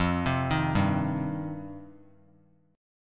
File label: F 7th Suspended 2nd F down; F7sus2; arpeggiated; JGuitar